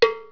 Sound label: STEEL DRM; toon